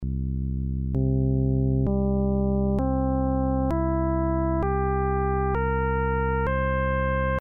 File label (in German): oberton